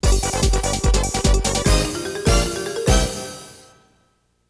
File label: Victory Tune; hebereke no pair pair wars sound collection